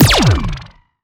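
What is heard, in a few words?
Sci weapon Shot Small Laser Zapping Pulse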